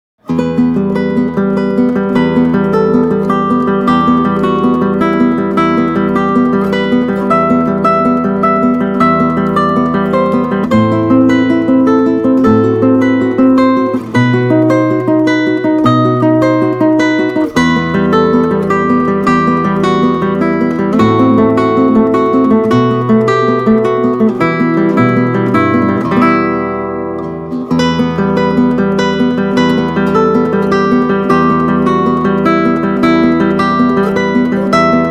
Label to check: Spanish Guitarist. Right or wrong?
Right